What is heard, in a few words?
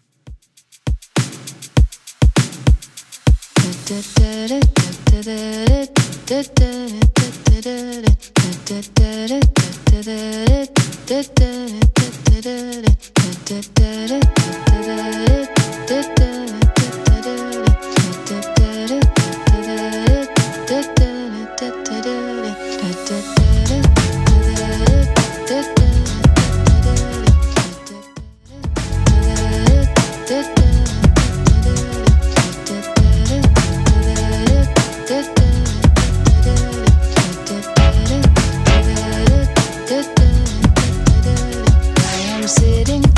90s Redrum 100bpm